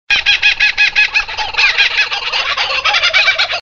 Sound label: Laughing kookaburra birds